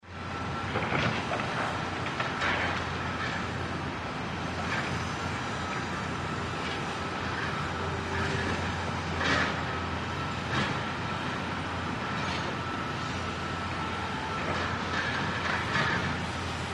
CONSTRUCTION & FACTORY Background DEMOLITION SITE: EXT: Distant machine movement, distant voices, dumping of debris.